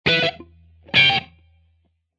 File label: Slick Snakey Guitar; Funk & Rock; Loopology; Get Dirty